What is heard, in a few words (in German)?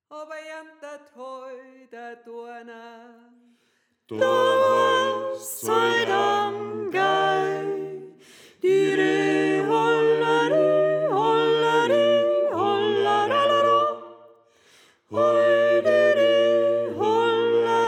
Sopran